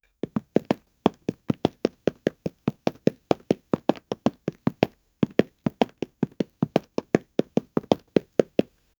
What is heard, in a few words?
the exaggerated sound of footsteps tiptoeing in a cartoon